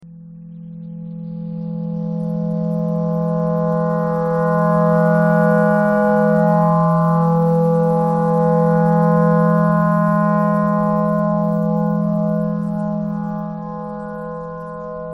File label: clarinet thread noise